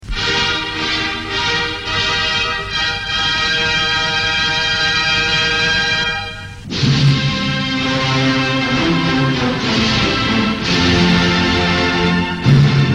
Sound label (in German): Sigesfanfaren kurz